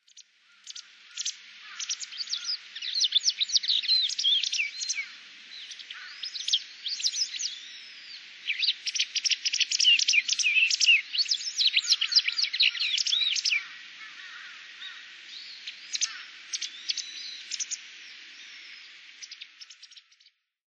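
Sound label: White Wagtail; utsunomiya; koe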